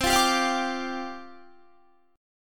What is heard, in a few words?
C Suspended 2nd Suspended 4th, down, Guitar